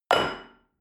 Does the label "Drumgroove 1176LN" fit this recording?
No